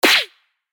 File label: Join Hit; Boomerang Fu